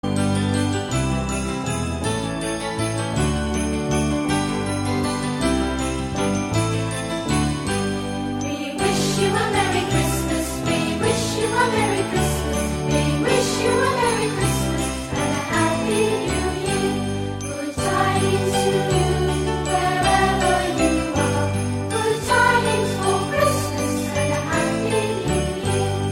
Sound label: happy xmas and new year